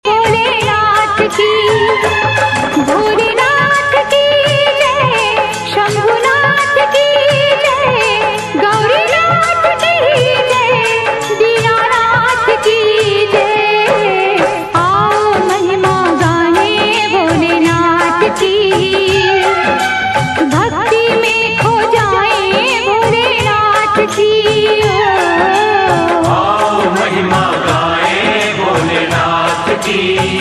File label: Female Version